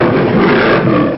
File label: lion sound